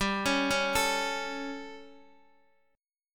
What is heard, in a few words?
G Suspended 2nd Flat 5th G, Guitar, Open D; chordshape; down